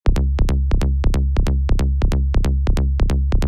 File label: Bass Midi trance